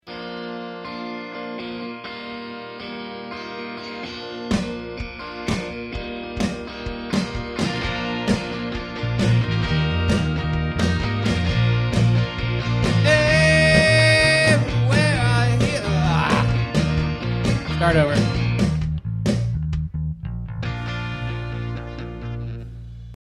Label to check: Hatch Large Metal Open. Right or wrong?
Wrong